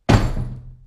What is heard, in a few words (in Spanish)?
puerta